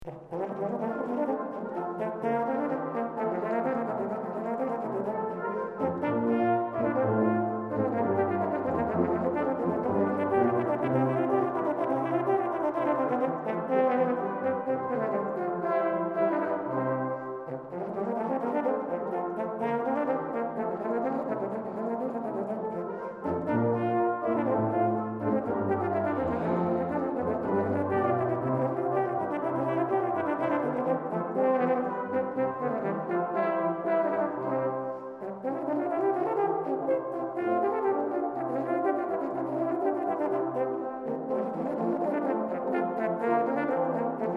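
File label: Sonata Nr. 1 in F Dur, IV Allegro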